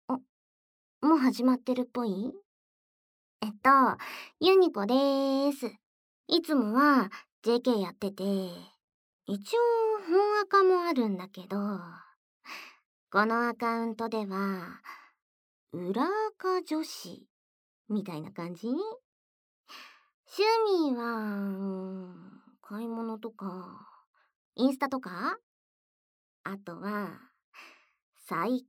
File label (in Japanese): 耳舐めボイス; JKがSNSにアップした耳舐めボイス